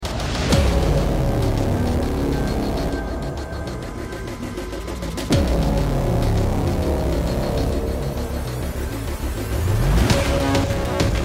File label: suspense; ragemap2023